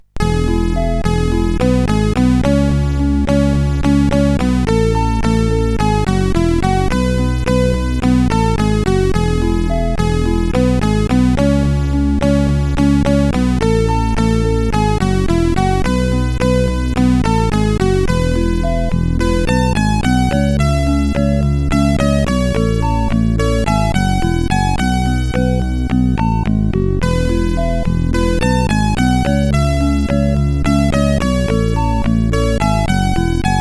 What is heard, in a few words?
Next Music, sid CSG8580R5